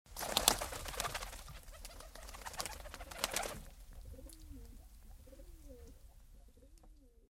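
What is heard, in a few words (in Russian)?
голубь летает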